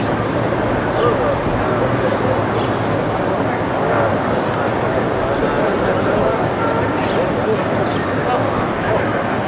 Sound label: ambient port; World Of Warships; clanbase